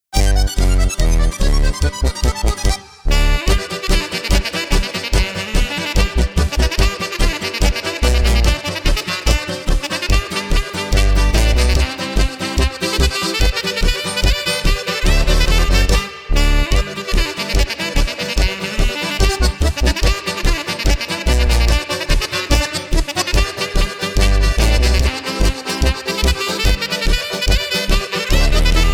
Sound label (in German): Tiroler